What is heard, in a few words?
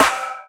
snare one shot e key